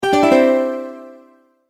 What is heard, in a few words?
multimedia tone alert; Tones (Sound Effects:Sound Design); McKinney Sound